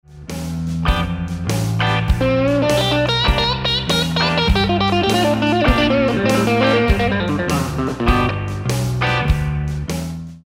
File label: Reconsider Lick, guitar